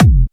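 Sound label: Cool bass drum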